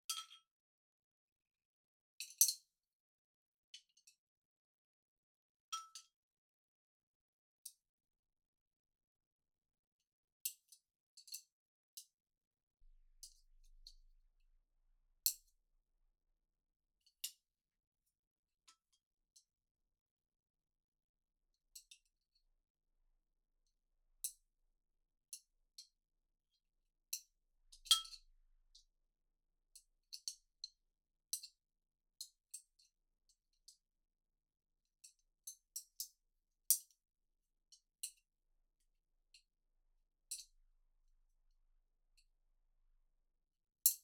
食べる 時の 音; 3DIO; 食事に関する音

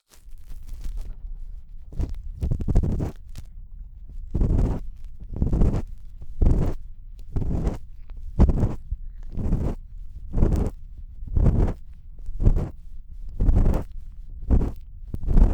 声なし 耳奥ゴリゴリ耳かき

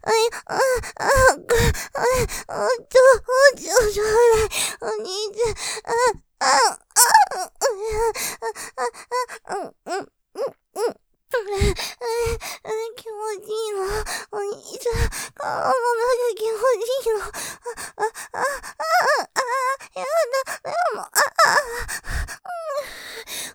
喘ぎ◆奥、もっとちょうだい◆大好きホールド風味で, ASMR; モノラル; ループで楽しむ！シェフの気まぐれ抜き抜きコース

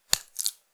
ぱちゅ音単発, ゆっくりめ

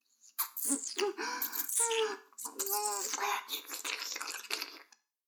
耳舐め音声(LR逆ver); 逆時間停止されて

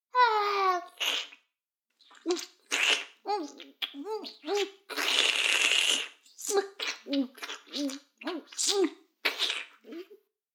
フェラ音声; 逆時間停止されて

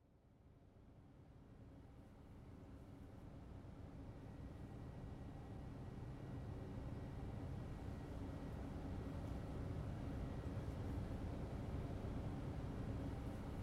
歯医者さん、歯磨き体験, ASMR; ASMRver

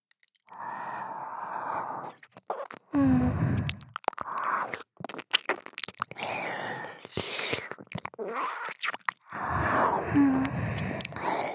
右耳舐め音のみ, ASMR

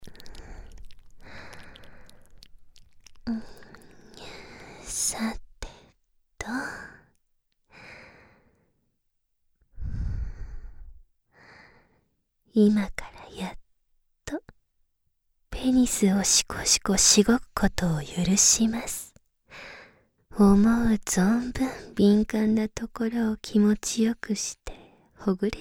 耳舐め（左耳）

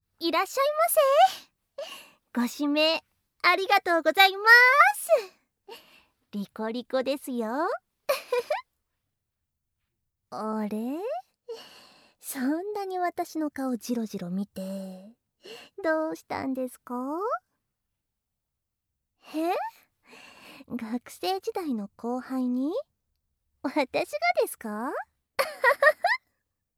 まったり耳舐め, ASMR

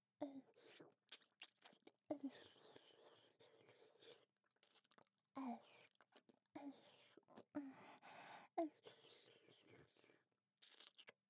れろれろ, 喘ぎ、耳舐めのみ, 乳首舐め

